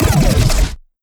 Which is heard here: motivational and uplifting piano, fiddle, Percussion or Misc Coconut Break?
Percussion